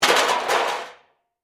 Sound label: Crash Metal Object, Crash, Metal, Objects; Crashes